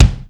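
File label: bass drum room; 300 Drum Machines; Yamaha Motif ES-Series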